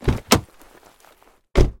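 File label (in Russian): calm measured short sound of a door opening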